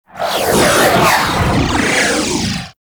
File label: Fighter Ship Attack Maneuver, Space Fighter Ship Engine; Warframe; RailJack; Enemy Ships; Corpus